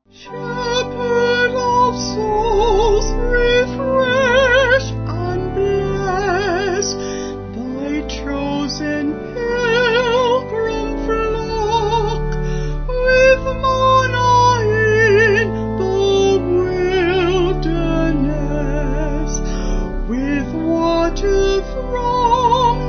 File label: Vocal Organ